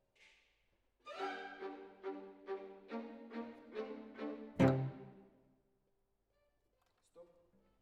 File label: Cello Solo